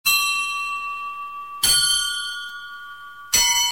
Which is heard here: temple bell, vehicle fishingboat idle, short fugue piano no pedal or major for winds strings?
temple bell